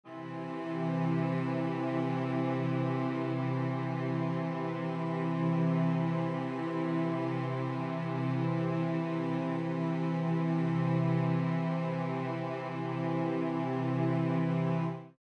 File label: 3rd in C, String Ensemble